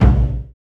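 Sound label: PRC DAIKO, Misc Drums, World Dance; Spectrasonics; Supreme Beats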